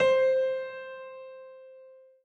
dial tone piano; Oppo Neo 5

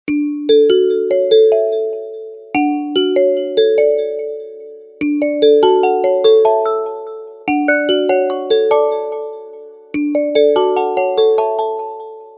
Sincere, ringtones; Alcatel; Pixi-3

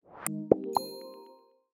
upload complete

